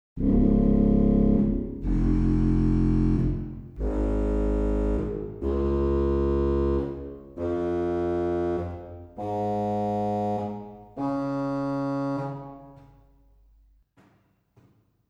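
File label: Posaune arpeggio, organ; 16'; Posaunen Baß; Reinhardtsgrimma; typical Silbermann middle-sized church organ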